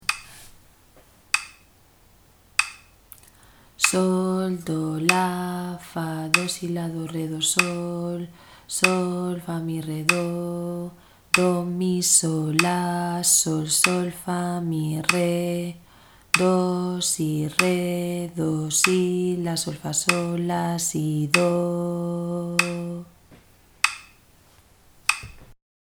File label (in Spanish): ritmo do; Do en 1ª